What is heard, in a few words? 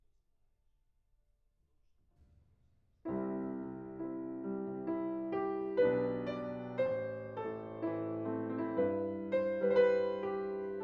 PIANO